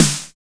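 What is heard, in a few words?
909 SNARE; Drums In Your FACE!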